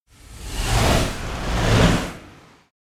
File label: Whoosh By Smooth Heavy Fast - Double; Hollywood Edge; sound designer's toolkit v2